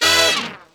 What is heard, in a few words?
Pop Horns E maj; Flakdemier; pitchglitch